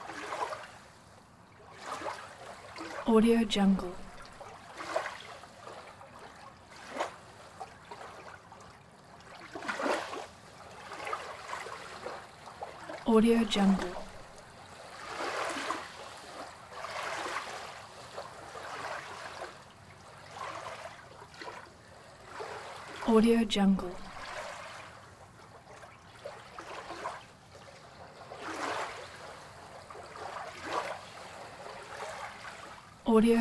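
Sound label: ambience pier; prosoundfx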